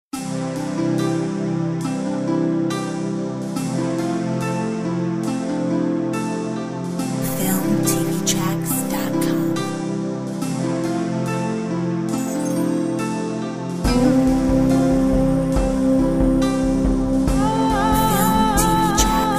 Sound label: Chinese tradition